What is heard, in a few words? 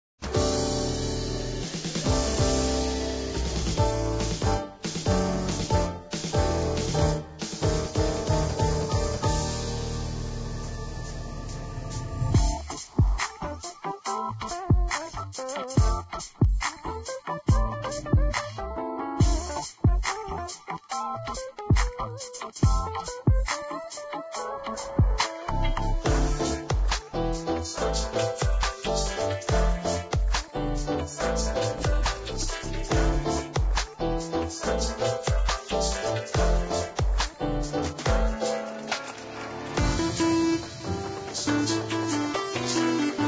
Keytar; Zomboid; Lifestyle